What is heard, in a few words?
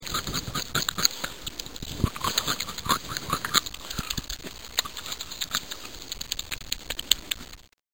bunny